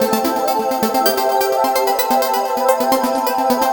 (arp) single chord; Sonic Foundry (Sony Creative Software); Ugly Remnants VOL-2

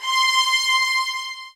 C, soft violins

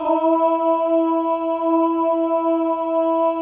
MALE OHS; Spectrasonics; Symphony of Voices

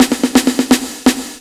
FILL DRUM; USB Soundscan vol.27; FILLACOUS

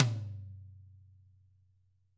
TM12 HIT, Drums, TAMA; ILIO; Double Platinum Drums 1; TAMA+TAMA

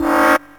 FX; AMG Megabass REMIX! VOL-2

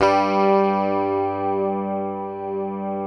PICKED, Bass; G2; Sampleheads; Will Lee Bass Library

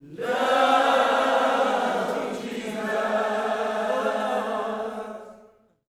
LOVE JESUS, Gospel

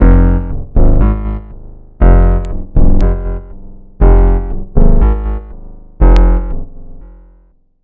Grumm, Baysline; Sonic Foundry (Sony Creative Software) - Future Retro Dance Excursions

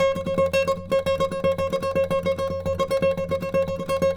NYL TR

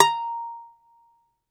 DOMRA A, Prima Domra, Plucked Strings; E-MU Producer Series Vol. 7 – Old World Instruments

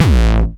909 Grime Layer 1 - A1, Kicks; Mbase 11 Kicks

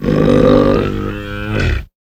MONSTER G; E-MU Producer Series Vol. 3 – Hollywood Sound Effects; Science Fiction; Pumpkin Head

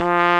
POP BRASS

